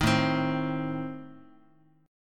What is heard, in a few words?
A Diminished A, Guitar; Adim strummed; 6,5,3,x,x,x; JGuitar